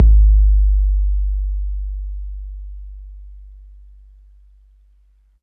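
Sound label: bass drum 808 MPC60 Click G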